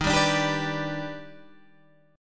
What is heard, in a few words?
D sharp Augmented Major 7th D sharp, chord